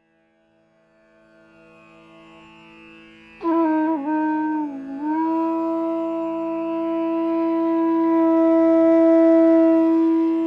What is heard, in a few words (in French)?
flute